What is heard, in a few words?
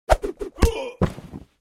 Ax Throw With Body Hit; Hollywood Edge Martial Arts and Human Impacts